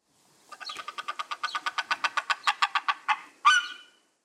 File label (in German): Riesenseeadler Haliaeetus pelagicus